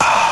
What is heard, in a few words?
"ah" exhale